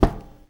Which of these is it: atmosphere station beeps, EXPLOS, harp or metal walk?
metal walk